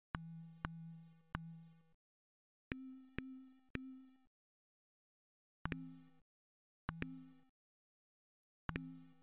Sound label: Click